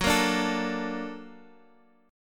A sharp Augmented Major 7th

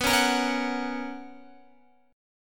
B Major 7th Suspended 2nd Guitar, chord

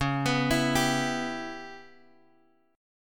C sharp Minor 7th Flat 5th, Guitar DADGAD; 11,14,14,12,x,x

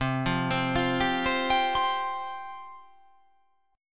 C Suspended 4th C

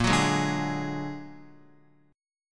Bb Augmented 7th Bb down Guitar 4E,4A,5D,5G,5C,6F; Bb+7; strummed; JGuitar

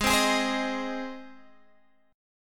Ab Major Ab down Guitar Standard; strummed; JGuitar

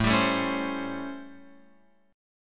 A 7th Sharp 9th, Guitar, Open F

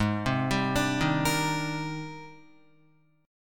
Ab Major Sharp 11th Ab down Guitar; AbM#11 arpeggiated

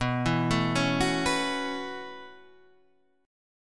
B 7th Suspended 2nd Suspended 4th, chord; B7sus2sus4 Chord {7 7 7 6 7 7} arpeggiated

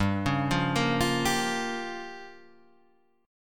Db 6th Flat 5th

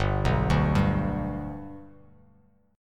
G Major G down, chord; G arpeggiated; JGuitar

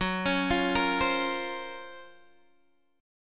F sharp Major Sharp 11th F sharp, Guitar Open G; chordshape; down

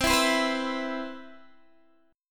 C Major 7th, Guitar DADGAD

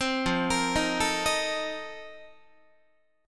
F 11th F, down, Guitar; F11 arpeggiated; 15,13,13,13,13,14

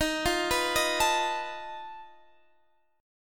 F 7th Flat 5th Eb

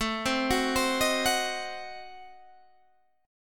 A Diminished 7th A down Guitar Open G

